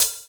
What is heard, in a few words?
Open Hhat, Hihat; Equipped Music; Loungin House